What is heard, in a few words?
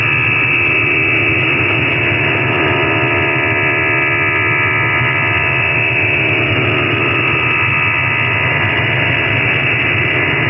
prc 4 4 traffic, psk